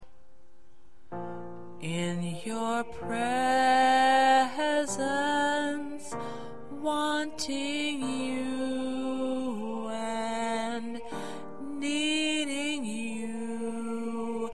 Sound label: Lead